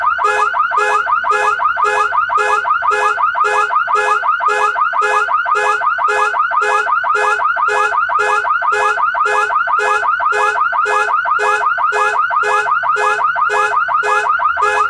car alarm; midnight guns; prop

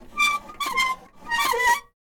valve wheel; midnight guns

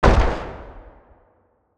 ssg fire dist; midnight guns

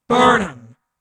camera; midnight guns; bootsector

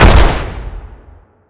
ssg fire; midnight guns

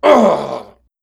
death, male; midnight guns; bootsector male 00

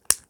mouse over; midnight guns

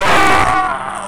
death, player; midnight guns

